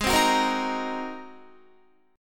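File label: A flat 9th A flat Guitar, chord; strummed; 4E,4A,5D,5G,5C,6F; 16,15,16,15,15,17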